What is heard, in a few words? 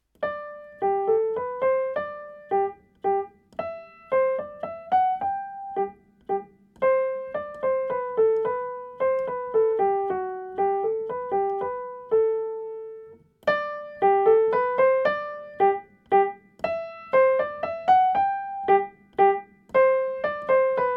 minuet in g